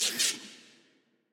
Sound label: Hurt